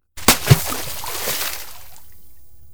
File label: miss; RS Games Sounds; Battleship